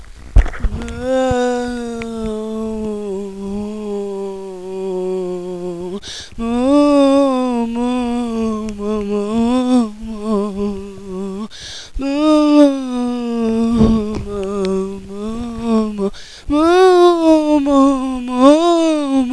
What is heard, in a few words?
moo